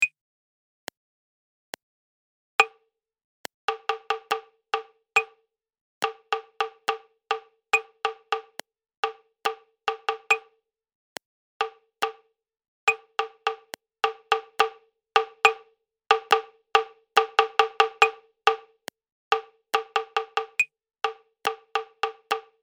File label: Prepared Rhythm; musicianship ii